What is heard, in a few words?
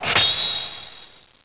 Knife